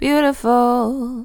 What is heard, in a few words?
Beautiful, Vocals Dry